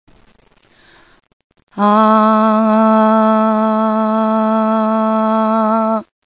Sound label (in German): menschl Stimme, Ton a